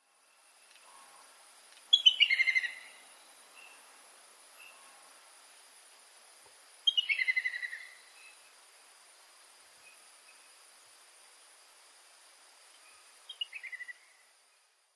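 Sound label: little grebe, mihara; kaitsuburi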